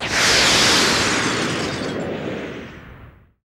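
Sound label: debris crash; E-MU Producer Series Vol. 3 – Hollywood Sound Effects; Science Fiction; Pumpkin Head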